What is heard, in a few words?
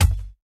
Weapon Rubber